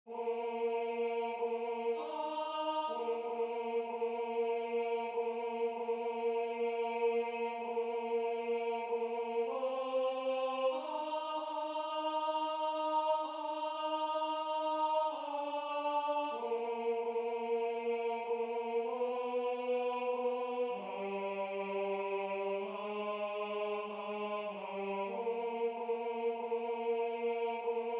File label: Sah ein Knab ein Roslein stehn Tenor